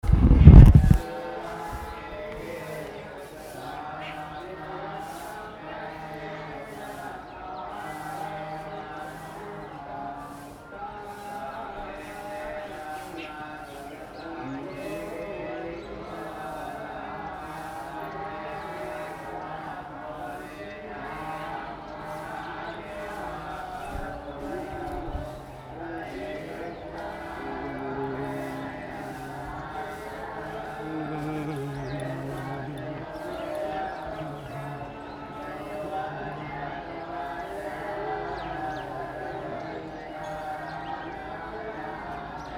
chants drone, North India

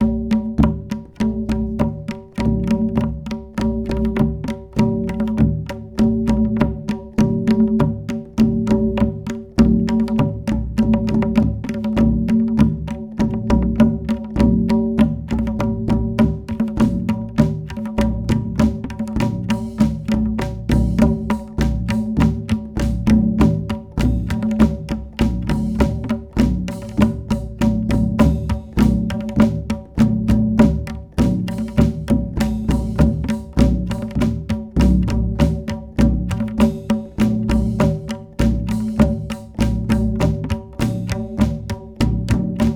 Tabla Beat Train

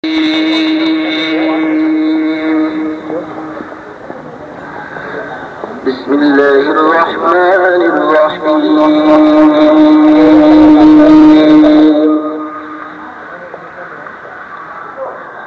shrine dictorphone mexico, street recording

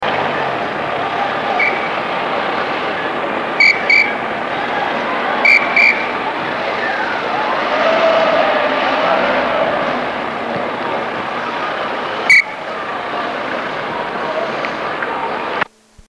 shrine dictorphone mexico, street recording

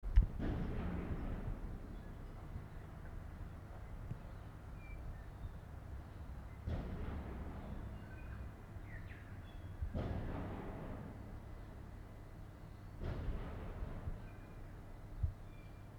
birds and bangs; Port Chalmers

